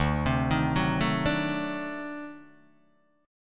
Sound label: D 7th Flat 9th D down Guitar Open D